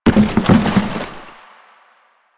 Janitor Cart Impact; Warframe; Ambience; Nineteen Ninety Nine

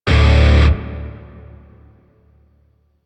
Shawzin Yo Chord B; Music; Grineer; DE; Warframe

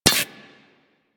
Laser Impact Metal; Surface

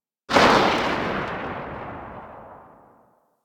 Ak Bolto Prime Tail Ext B; Tenno

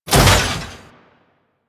Forest Piston; Ambience; Props; Warframe; Grineer Forest

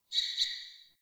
Sparrow Death State Double Chirp; Animals

